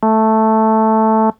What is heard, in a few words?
Nord Lead 4 sine FM in